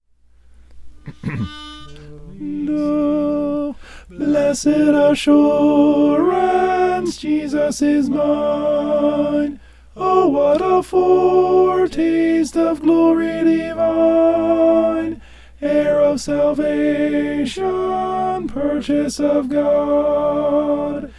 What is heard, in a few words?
alto; blessed assurance